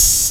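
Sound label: HH open hi-hat, Fresh Disco House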